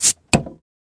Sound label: Bottled Drink Open; eating & drinking